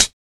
short high hat B key, hihat tuned to the B note. Loudest frequency: 5449Hz